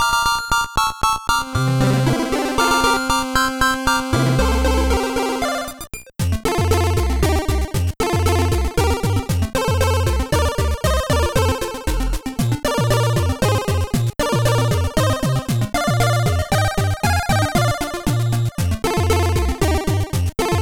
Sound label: 8Bit Beach Cave loop; PMD2